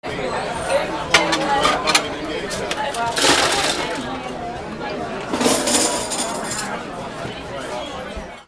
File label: AMBIENCE